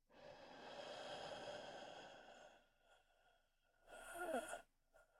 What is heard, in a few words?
Human, Adult, Male, Exhale, Mouth, Dying; UberDuo; Character Playset